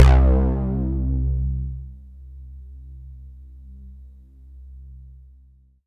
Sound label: C3, synth; 90 s Sample CDs; NiceBeats; SynthLine VOL-23